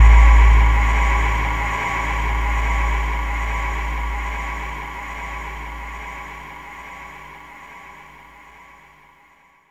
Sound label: Huge Fade One Shot; Drum & Bass; Misc; Sonic Foundry; Futurist Drum & Bass